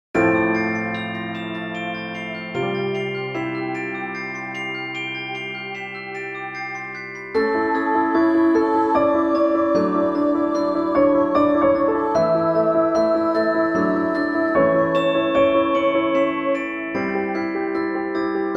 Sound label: alto 1; Sanctus